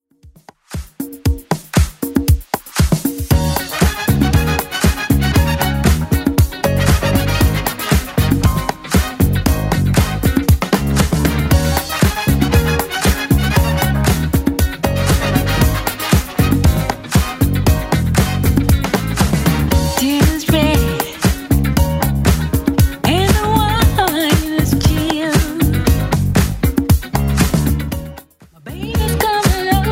80s Club Edit 117bpm

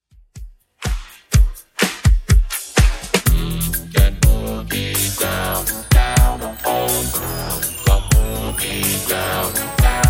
80s Redrum 124bpm

